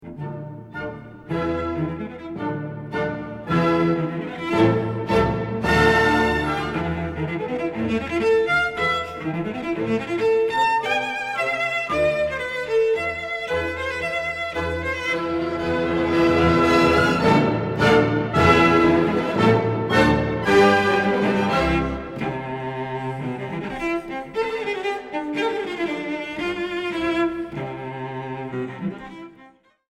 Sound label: Cello Concerto; R. Schumann; Atlanta Symphony Orchestra